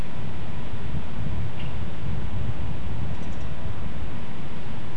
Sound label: high pitched rapid calls, nocturnal flight call; nocturnal flight call project in beijing autumn 2021